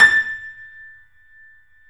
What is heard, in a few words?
A, 3-D Audio Collection, 3D Pianos, Yamaha C7